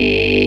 TALKR FUZZ, DANCE; Pro-Rec - Synthsonic; Z1 DANCE

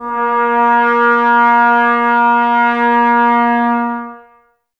brass, Tbs Mute; Miroslav Vitous - Woodwind Brass Ensemble

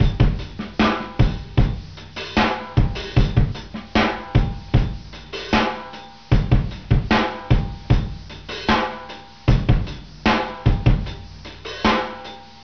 Processed Beats; RnB; GeorgePendergast

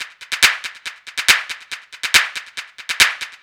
NRG Percussion, Trance; Sonic Foundry (Sony Creative Software); Trance NRG VOL-1

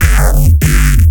Reese; neuro; BIG5AUDIO

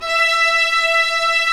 STR, Vlas Sect, Agitato

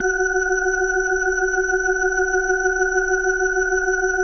B3, First Call Vintage Keyboards

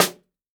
jungle snare chromatic, real drums

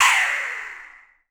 air whistle; Voice Crystal - Steve Reid Definitive Percussion

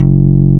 NYLON F#1, BASSES

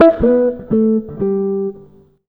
jazz, acoustic guitar loops

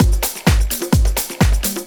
Latin Loop 128 BPM; House; Best Service; House Definition VOL-1

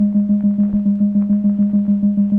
Optigan keys; Roland LCDP09 Keys of the 60s and 70s 1

